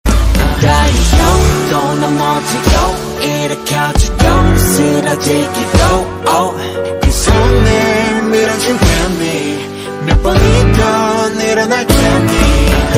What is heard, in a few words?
korean ringtone